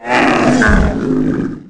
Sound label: Idle Roar, Ice Beast, Aliens